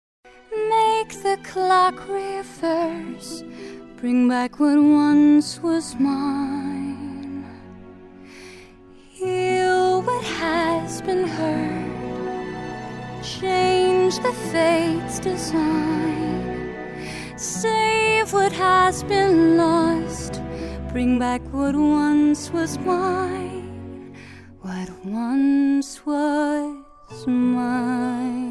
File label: healing incantation; tangled